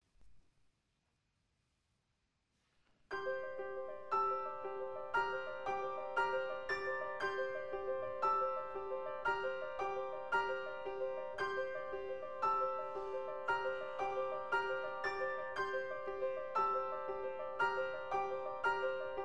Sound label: Bells and Piano Only